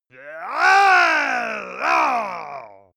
monster male growl aggressive; kreaturen

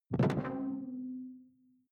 sci-fi alien bounce detuned; swooshes-swishes

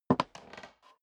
step dress boots wood creak single right; schritte; Footsteps; menschen

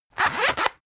zipper long open rapid; objekte; reissverschluss

